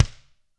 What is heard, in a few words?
GRCH KICK; ILIO; Double Platinum Drums 1